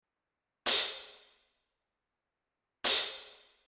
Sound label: choke